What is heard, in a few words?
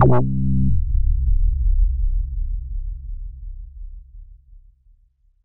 Slip N Slide Bass; AMG - Prototype Drum & Bass